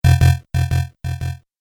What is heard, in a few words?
Multimedia Lo-Fi Error With Delay, beep; Andrew Fleming